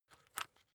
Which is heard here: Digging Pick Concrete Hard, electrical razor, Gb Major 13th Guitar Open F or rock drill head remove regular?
rock drill head remove regular